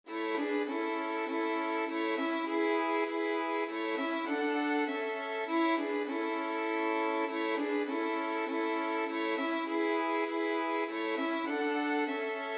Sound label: violin trio; come thou fount